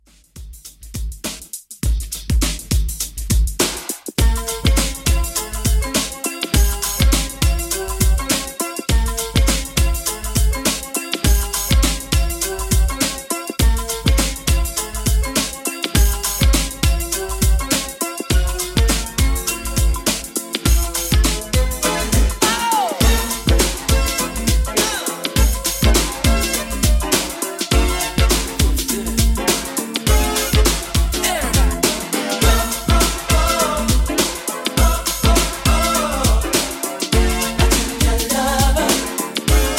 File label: 80s Funk 102Bpm; Redrum